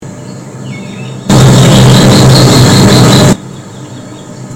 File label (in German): turmfalke; Mag. Peter Holub